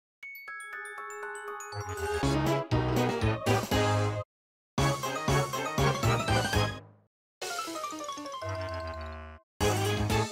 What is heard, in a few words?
Jingles; Ashley; WarioWare Gold